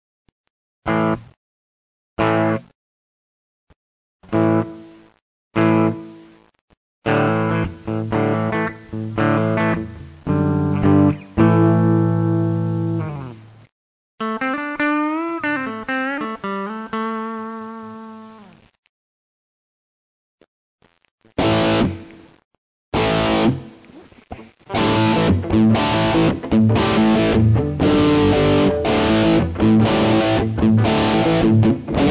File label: Room